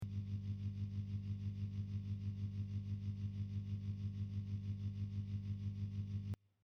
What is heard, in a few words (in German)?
Brummen bei aktiviertem Tremolo